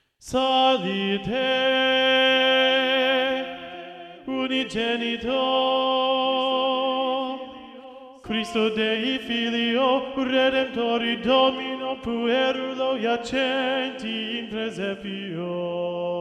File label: psalite unigenito singt und klingt tenor predominant; schola